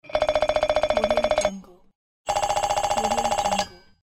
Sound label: count up mechanical